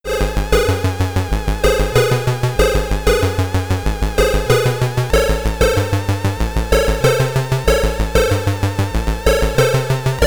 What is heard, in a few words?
Stage Clear (SID Stereo); Poltergeist; Commodore 64